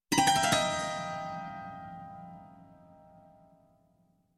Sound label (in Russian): imitaciya zvuka arfi na zloveshem fortepiano